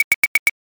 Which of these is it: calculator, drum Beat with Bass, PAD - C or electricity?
calculator